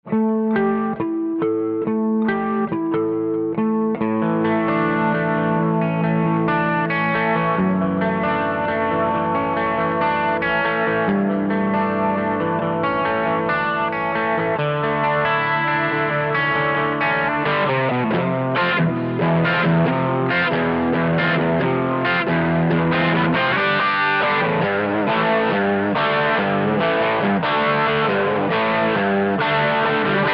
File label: GT-10 Clean